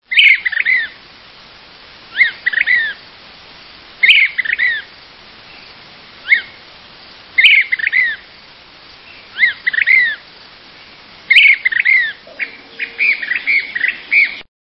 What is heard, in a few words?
Brown crested Flycatcher